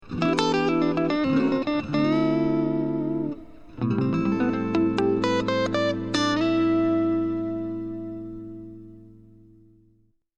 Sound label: lead chord land e3, guitar